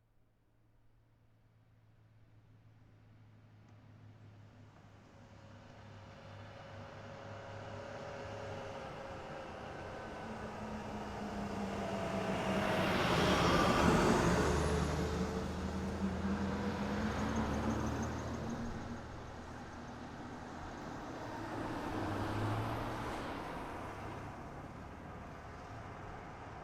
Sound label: car drive by